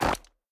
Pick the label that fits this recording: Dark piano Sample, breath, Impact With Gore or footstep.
footstep